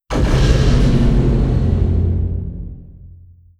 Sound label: distance neutron fire layer; apocalypse